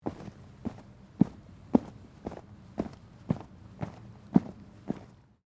Gymnastics Walk, Sports, Gymnastics, Walk, On, Mat; Miscellaneous Sport; BlastWave